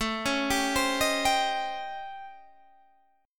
A 7th Sharp 9th Flat 5th, Guitar, DADGAD; 19,16,17,17,18,17

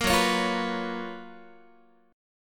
Gb Major 11th, down, Guitar; GbM11 strummed; 13,14,16,15,15,x; 4A,4E,5A,5D,5G#,6C#

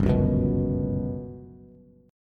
G Major 7th Flat 5th, Bass; strummed; JGuitar

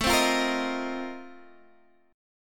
A sharp Major 7th Suspended 2nd Suspended 4th A

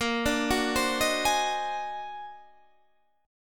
Eb Minor Major 13th Bb

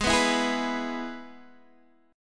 Ab Minor Sharp 5th Ab down Guitar DADGAD

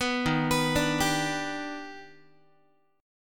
D sharp Augmented Major 7th D sharp Guitar; arpeggiated; 14,11,14,12,11,x; JGuitar

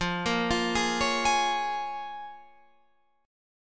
A sharp Minor 7th

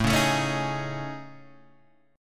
A Major Sharp 11th, Guitar, Half Step Down; AM#11

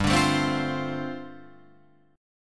G 7th Suspended 2nd Suspended 4th, Guitar Open F

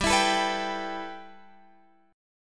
G 6th Add 9th, Guitar, Open F; G6add9 strummed; x,14,16,16,14,14

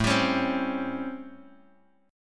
A 7th Sharp 9th, Bass Standard

